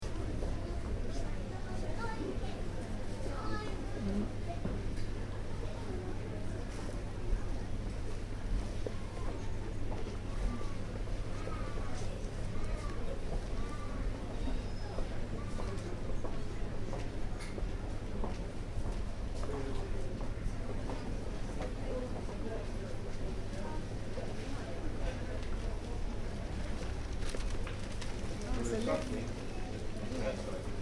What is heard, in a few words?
Department Store, Interior: Ambience; Stores and Malls; Backgrounds; Stuart Duffield